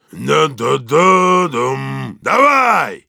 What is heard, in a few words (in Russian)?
Heavy singing, ru